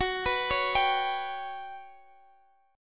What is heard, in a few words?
G Major 7th Flat 5th F sharp